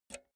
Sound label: pull out jack multi socket quick